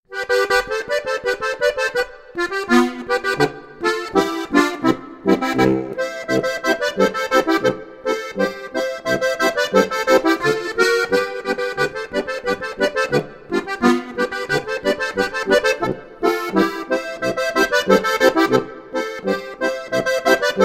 weerberger steinschaf boarischer

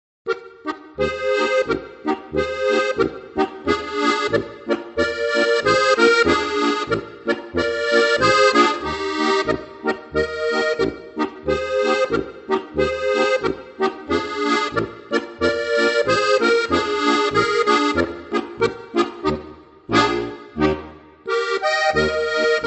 polka

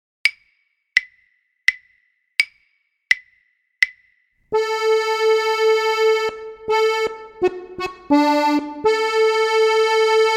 die ringelnatter harmonikaduo

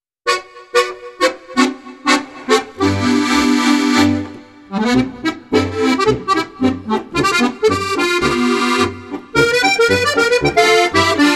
swing

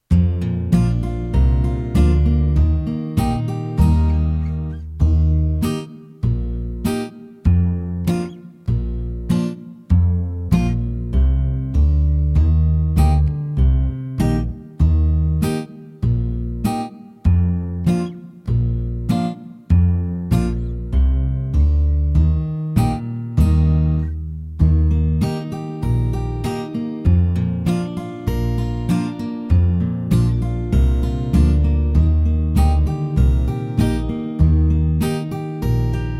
ennstaler polka 2 knopfreihe

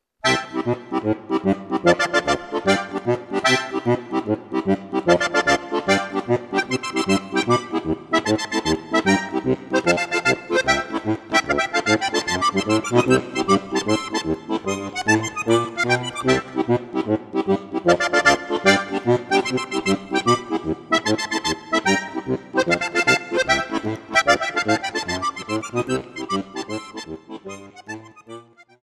shuffle

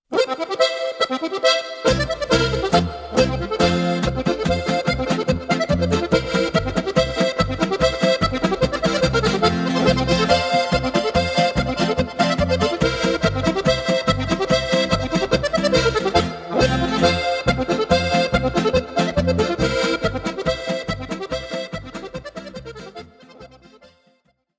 polka

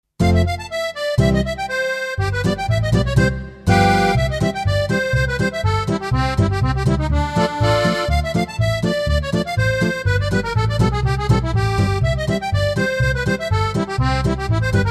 easy polka

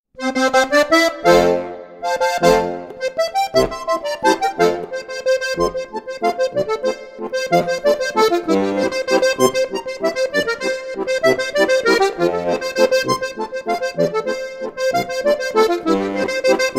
edelweiss landler

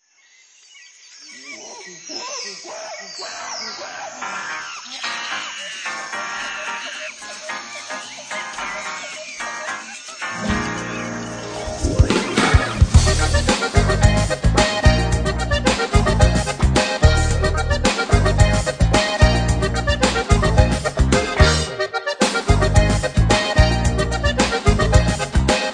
jungle beat; Austro-Pop für die Steirische

